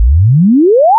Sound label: sine sweep exponential